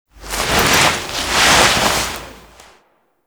Hub Apartment Foley; Warframe; Zariman; Cinematics

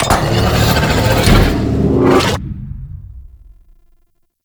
Death Orb Activate, Traps; Warframe; Orokin

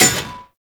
Melee Axe Impact Generic; Warframe; Axes

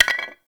Clay Pot Hit Drop; Warframe; Awakening Remaster

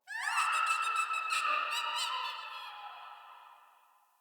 Signature Call; Ambience; Corrupted Ode One

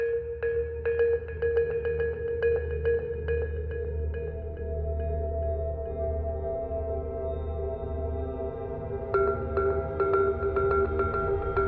Amb Cue; Hubs; Syndicate Steel Meridian; Tenno Hubs Steel

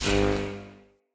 Ambient Tesla Rod Zap; Encounters; Ode Pollution Tower

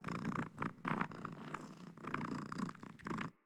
Kitten Purr; Enemies; Catbrow

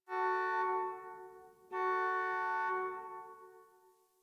Parking Lot Distant Car Horn; Warframe; Ambience; Nineteen Ninety Nine

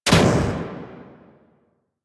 Weapon Liberty Launcher Explosion; Warframe; Caliber Chicks Minigame; Weapons

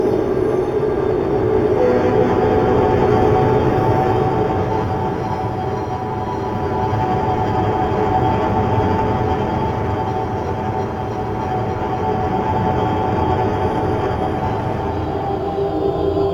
Seance Room Amb Loop; HarrowQuest

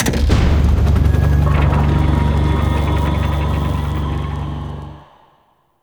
Windmill Power On; Warframe; Duviri; Power Grid

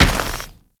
Moa Combined Step Dirt; Enemies; Warframe

